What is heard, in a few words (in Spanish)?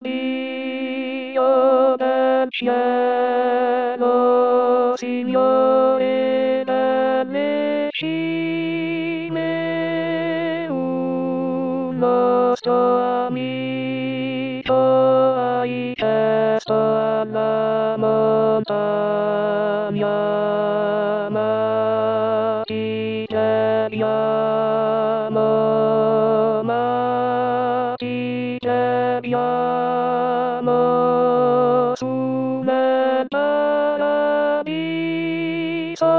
voz